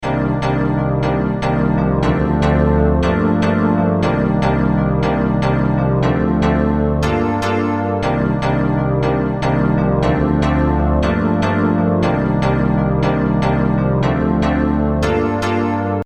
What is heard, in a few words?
Piano